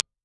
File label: IR Oktava MK18 Overload